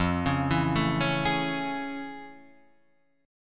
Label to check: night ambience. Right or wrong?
Wrong